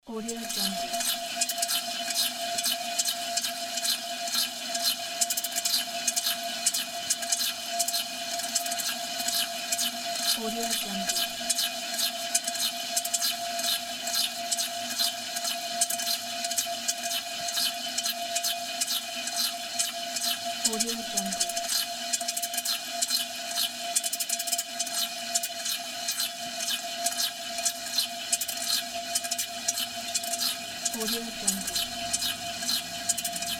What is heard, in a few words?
water closet toilet sink